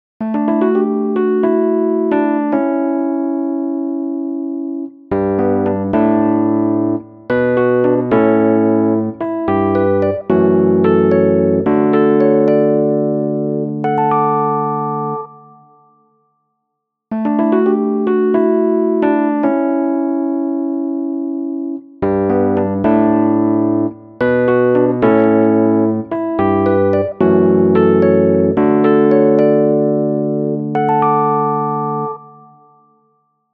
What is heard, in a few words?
piano